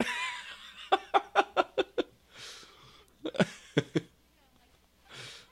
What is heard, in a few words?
man laugh; Voice, Human